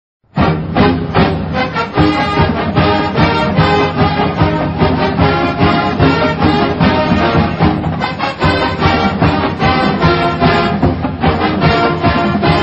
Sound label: fight song; oregon ducks